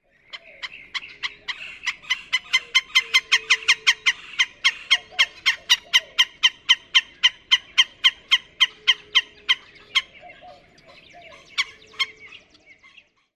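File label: chi calls, bird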